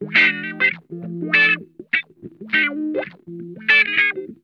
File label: MUNCH WAH, Funk Guitar; Zero G - Funk Guitar